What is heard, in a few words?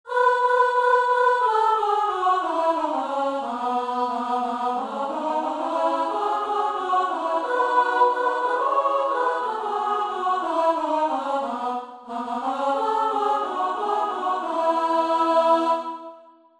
Bunch of Damned Whores Tutti Verse 1 All